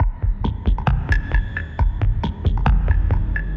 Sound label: Wood Drip Kit 134 bpm; MIDI Clips; Drums; Ableton; Factory Packs; Mood Reel